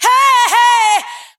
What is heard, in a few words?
hey hey SUNG